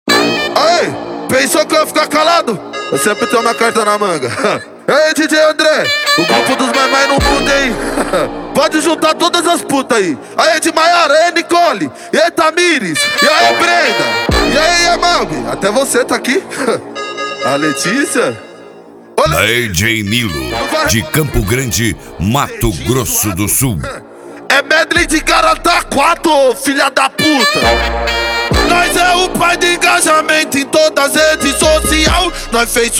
set funk bass

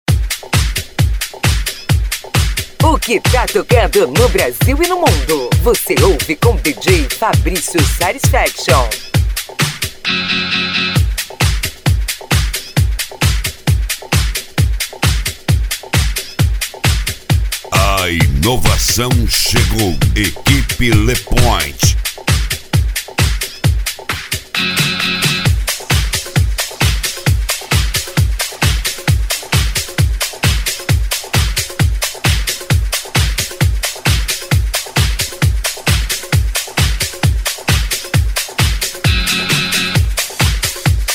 deep house

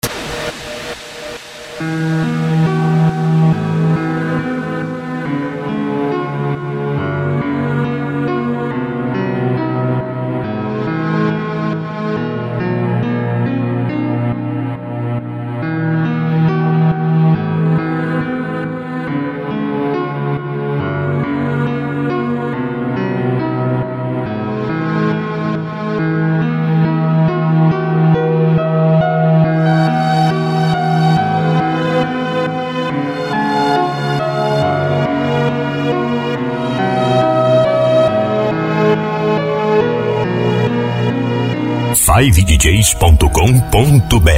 mega electro mix

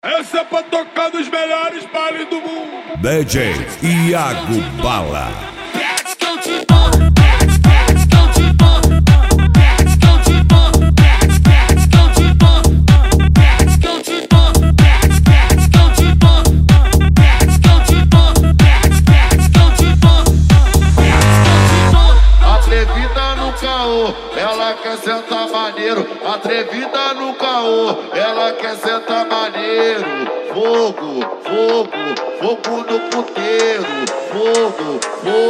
especial mega funk

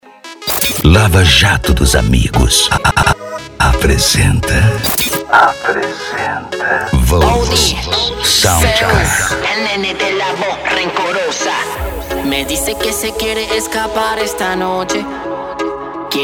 sound car reggaeton; cd volks sound car

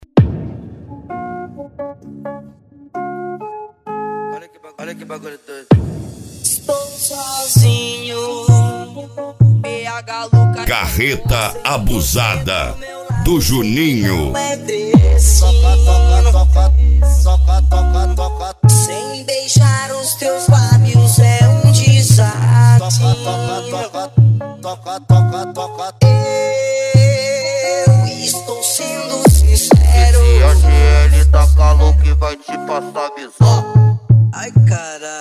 mega bass

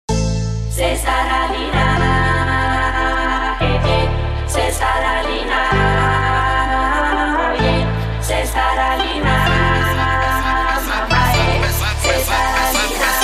mega funk; say what

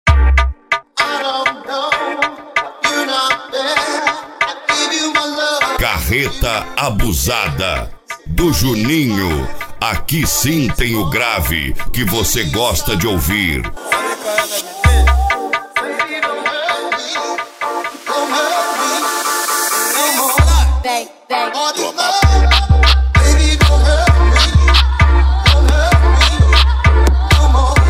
mega bass

